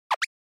Anime Motion High

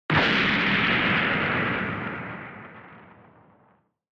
Retro Anime Gun Long

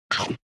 Motion Eating Dry

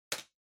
PC-Keyboard (Far-Space-Hard)

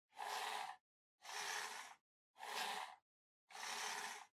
PC Mouse Move Far Long

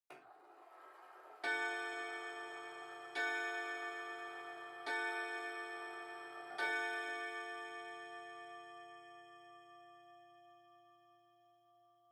Pendulum Clock Chime Far Long

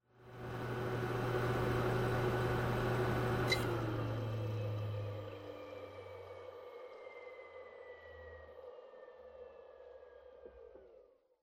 PC Operating Noise Forced Shutdown

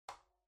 Electric Fan (Far-Switch-Swivel)

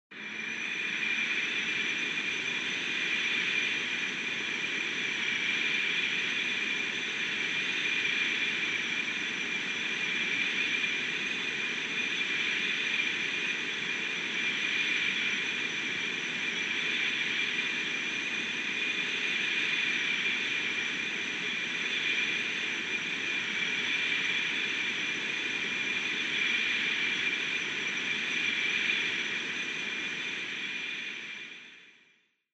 Retro Anime Jet Far

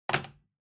Calculator (Put)

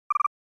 SNES RPG Message

